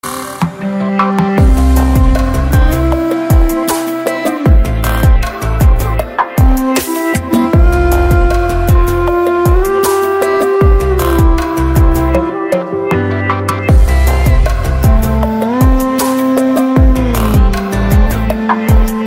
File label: Instrumental Tone